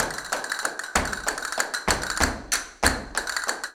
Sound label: CASTANETS, Flamenco